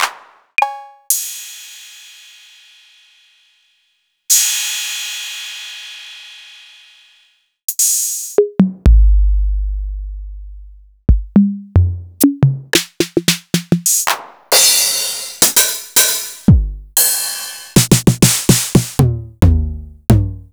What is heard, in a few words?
Akai MPC2500 Electric Kit